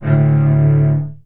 short strum, cello

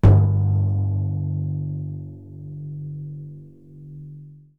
timpany

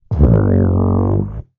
short and bubbly, tuba

